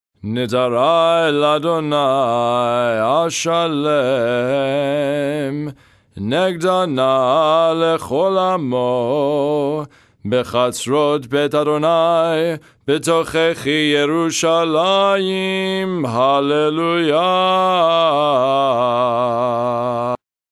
nusach ukrainian dorian; Psalm 116 v.12-19 (Nusah Ukrainian Dorian); Festival Shaharit (Lower Voice)

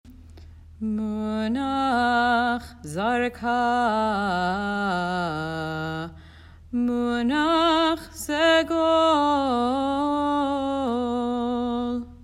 munach zarka munach segol; Munah Zarka Munah Segol; Cantillation for High Holidays

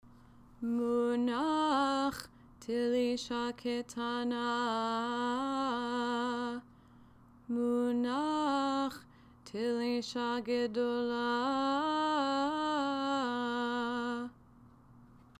munach telisha k tana munach telisha gedolah

